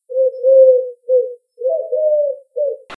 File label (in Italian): tortora; test riconoscimento versi animali